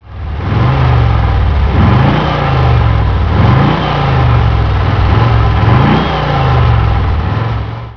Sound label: porsche 356